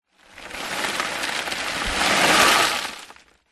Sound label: stones small debris in cardboard box tip to side movement; foley; zapsplat